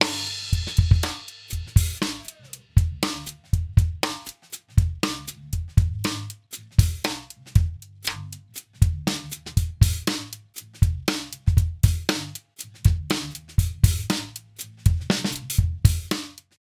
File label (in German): Subgruppe Parallel Bus